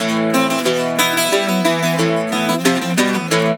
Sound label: Celtic Flavours; USB Soundscan vol.42; AKAI